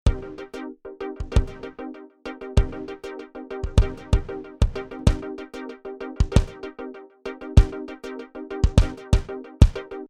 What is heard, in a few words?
Transient Kompakt Kick